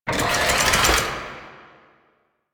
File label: Melee Slam Charge; Warframe; Necramech